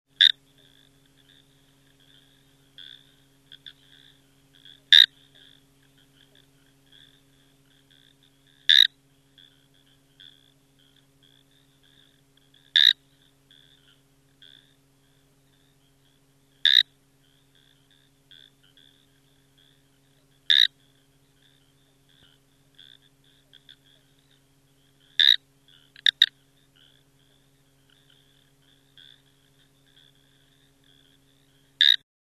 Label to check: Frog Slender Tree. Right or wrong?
Right